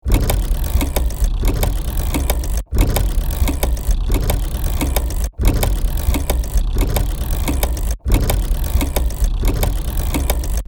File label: Clicky crunchy mechanical movement loop; Steampunk; gfxsounds